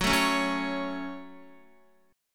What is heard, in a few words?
F sharp Minor F sharp, Guitar; strummed; JGuitar